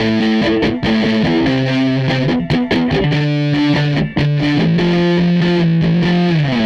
Les Guitar Loop

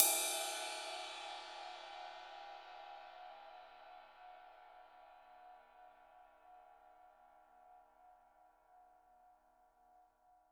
CYM; Bob Clearmountain Drums I

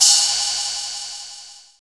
TEK HUBCAP, industrial; Roland - LA Composer vol. 1

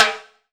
PC, Casio CTK-5000

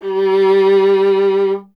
viola, solo instruments; Miroslav Vitous; Solo Instruments VOL-1

